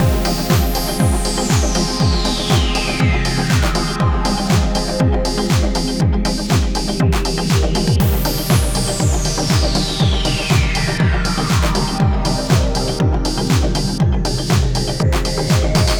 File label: Beats 185